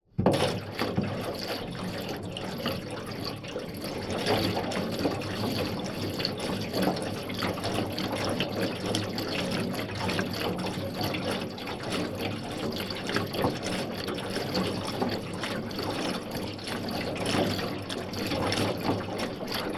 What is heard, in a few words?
Water Swirl Metal Trashcan Light Medium; Collected Transients; rOtation